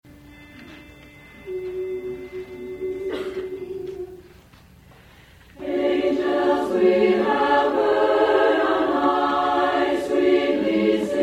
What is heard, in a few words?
Angels We Have Heard on High, Chorus; learning tracks